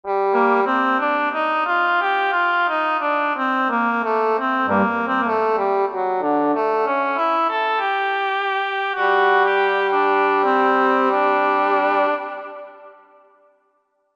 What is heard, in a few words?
Wood Wind, Korg MSC-1S; Korg MSC-1S Variety